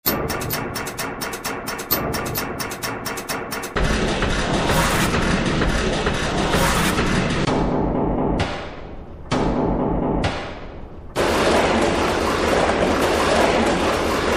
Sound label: titanium rhythms